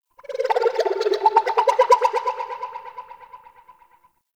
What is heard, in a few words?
Ocarina; Warframe; Nokko Colony; Ambience